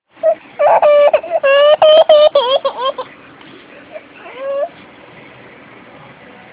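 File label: baby hungry, male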